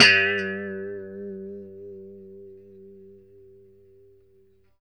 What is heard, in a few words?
Pop G 2, Strat Pop